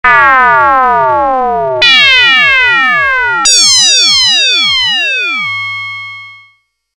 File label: FALLING; mfb; synthII